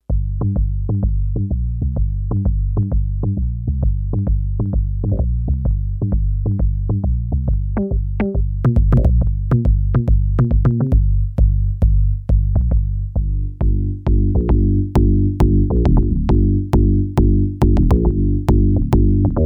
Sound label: Bass Mode